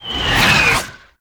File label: Landing Air Whistle; Chem Nox; Warframe; Nineteen Ninety Nine